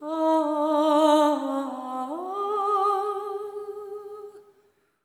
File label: LAMENT; Spectrasonics; Symphony of Voices; LAMENTING